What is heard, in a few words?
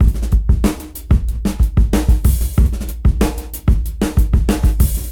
Busy Break 93 BPM; AMG - Keith LeBlanc Phat Butt Jams